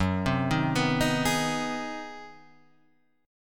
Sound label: G sharp 7th Sharp 9th